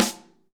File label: snare dry; Real Mega Drums